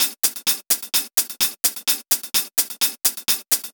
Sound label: Minimal Loops